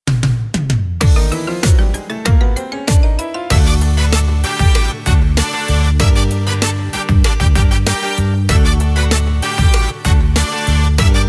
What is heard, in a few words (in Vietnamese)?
beat